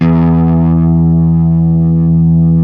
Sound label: Les Paul, guitar